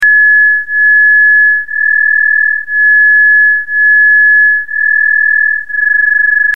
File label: Throb